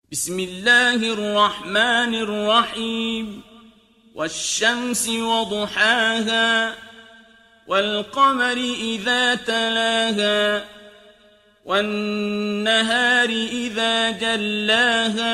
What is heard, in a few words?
surah shams tartil; quran; abdulbasit; religion